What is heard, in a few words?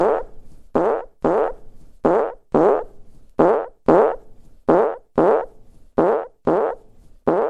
girls farting